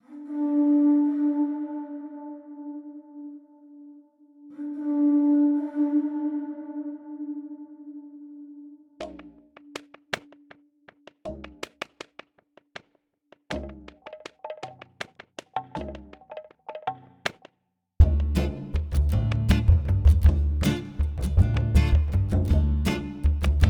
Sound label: instrumental